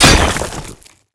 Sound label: metal heavy slice stone; Warcraft III Frozen Throne